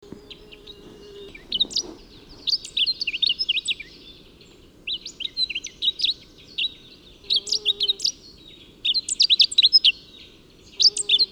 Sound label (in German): Rhododendrongimpel - Carpodacus subhimachalus